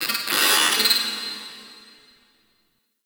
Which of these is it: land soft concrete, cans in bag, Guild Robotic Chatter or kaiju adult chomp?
Guild Robotic Chatter